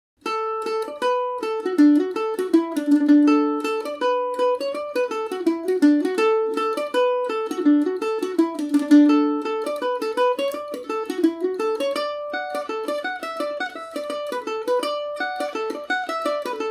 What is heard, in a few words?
john watt henrys dmaj, mandolin